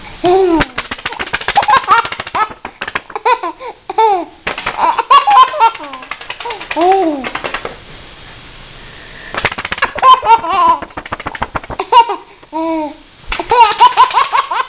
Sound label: baby